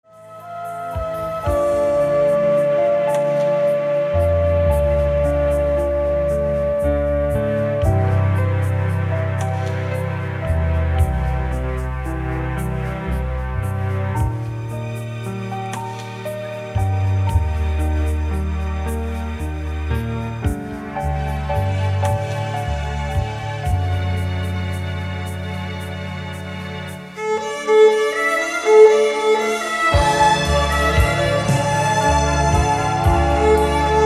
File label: violin solo; Acousmonuments